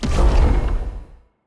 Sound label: healing hit, weapons; Stellaris; biogenesis